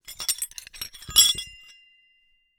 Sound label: Hole Saw Rattle, Hole Saw Bits